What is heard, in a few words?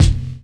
Wu Kicker; Drum Hits; Kicks; Loopmasters; Jon Carter & Alex Blanco; Block Party Essentials